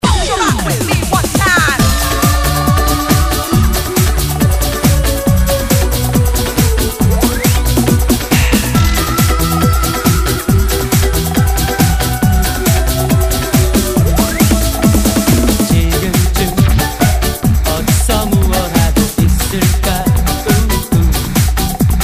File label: Techno Remix